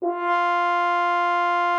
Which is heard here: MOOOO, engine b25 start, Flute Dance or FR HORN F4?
FR HORN F4